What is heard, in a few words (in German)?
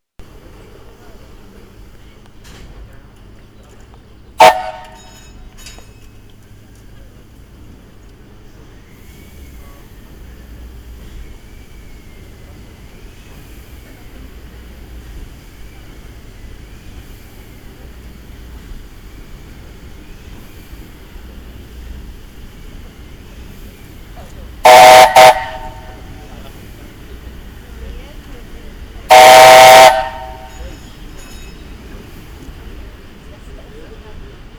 Horn Traunsee; MS Gisela